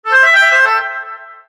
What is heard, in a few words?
Caves; PlusFourSix; Sania; Lars Edvall